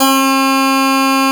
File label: Harpsichord E2